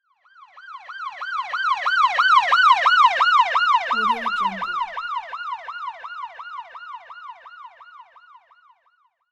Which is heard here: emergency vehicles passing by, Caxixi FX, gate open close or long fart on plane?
emergency vehicles passing by